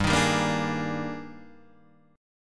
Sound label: D sharp Major 11th, G, guitar chord